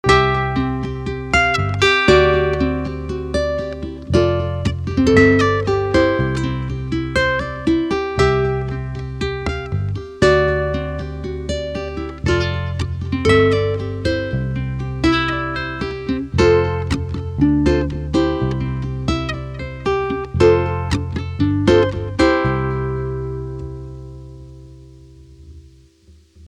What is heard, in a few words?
uke pattern